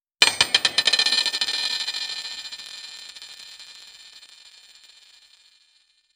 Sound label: orchestral hit